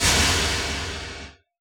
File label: Noise Echo, Yamaha DJX; 155 DJX Kit